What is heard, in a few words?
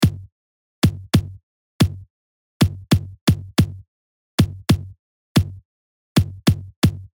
Kick 16D